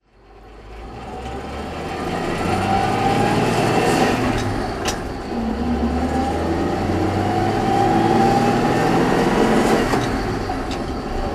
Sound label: opel tanker truck driving, Opel Blitz tanker truck; 1960; Engine sound driving; V3D/ ClassicarImages; Marc Vorgers